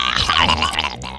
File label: DROWN; imp